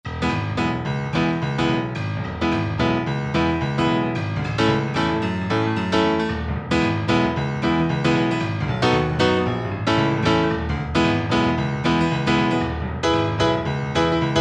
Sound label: Easy Rocker, Roland SRX-07 Ultimate Keys